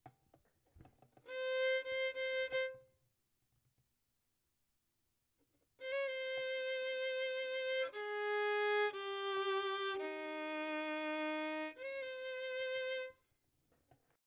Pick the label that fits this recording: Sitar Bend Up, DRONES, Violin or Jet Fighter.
Violin